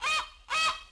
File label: bird Call; theed; Star Wars Obi-Wan; XBOX